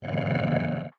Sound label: Wolf Growl; Wolf Link